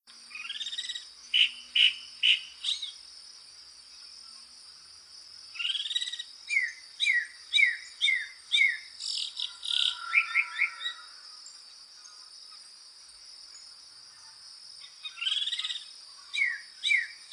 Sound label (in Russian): pevchij belobrovyj peresmeshnik